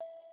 Call Start; LG SV770 Waffle

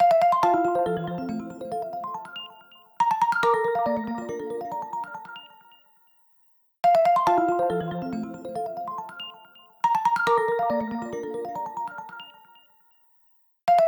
Balloon, ringtones; K10 T-Mobile

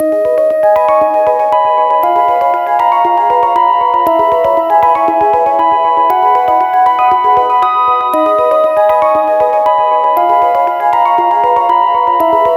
French Touch, ringtones; Sagem; Laurent Lozano

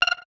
Charging Started; Nothing Phone (2)

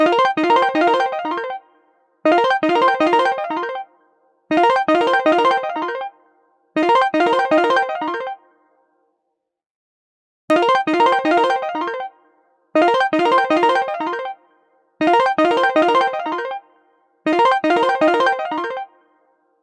Chic Electric Code, ringtones; LG K92 5G

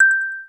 Sim Switch, Keytone sounds Tada; KM570 Cookie Gig

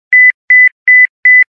Low Beeps; LG VX11000 enV Touch

